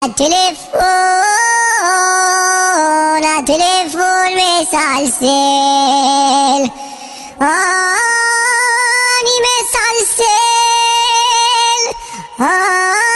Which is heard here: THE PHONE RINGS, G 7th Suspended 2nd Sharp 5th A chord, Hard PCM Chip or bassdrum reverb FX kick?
THE PHONE RINGS